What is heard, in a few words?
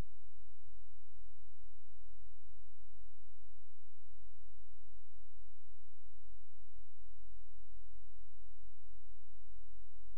HYDROPHONE 256Hz; JASCOAMARHYDROPHONE